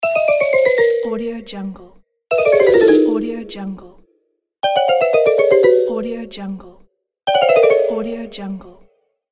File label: slot game over